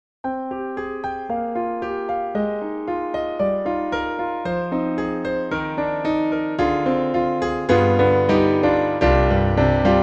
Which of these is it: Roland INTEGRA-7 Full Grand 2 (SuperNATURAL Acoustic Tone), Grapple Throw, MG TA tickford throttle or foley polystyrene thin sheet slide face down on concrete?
Roland INTEGRA-7 Full Grand 2 (SuperNATURAL Acoustic Tone)